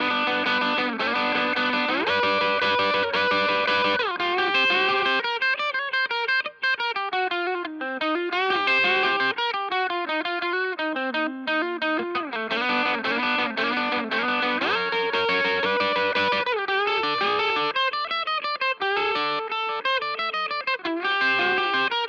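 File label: Hagstrom Crunch Bridge, guitar; Pat Smear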